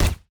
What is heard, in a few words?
bizon silencer; STALZONE